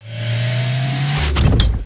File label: load, dropship; StarCraft v2.2.2; terran units